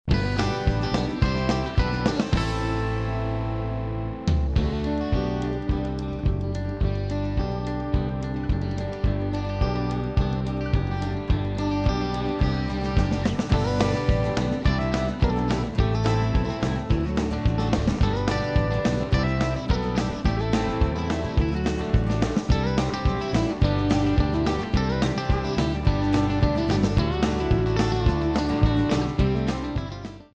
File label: country